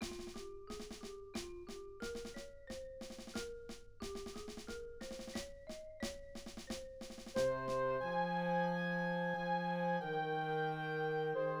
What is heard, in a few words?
Angels Sound C